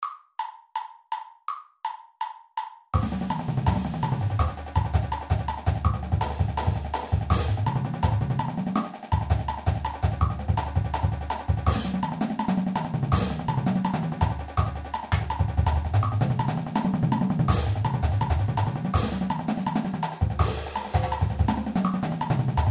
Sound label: paradiddles, percussion